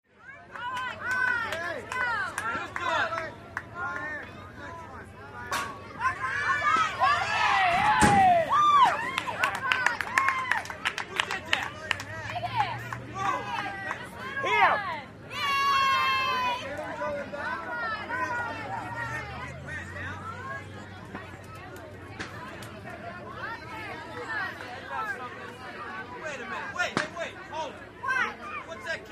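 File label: Outdoor Barbeque Party, laughs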